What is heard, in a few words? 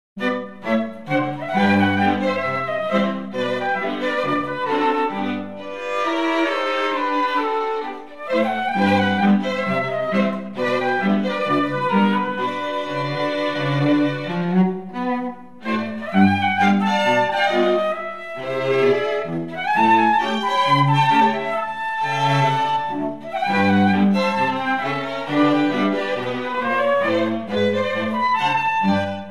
ragtime